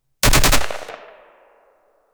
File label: different single shots, firearm